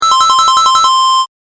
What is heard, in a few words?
correct; ５連続の大正解の高音ver; 正解の音・ピンポーン